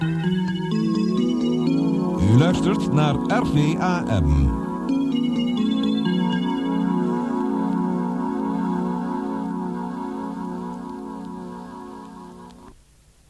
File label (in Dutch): Jingle; u luistert naar RVAM; Station Calls